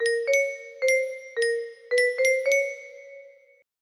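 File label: music box melody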